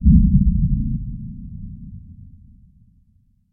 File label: explosion underwater distant; sound effects one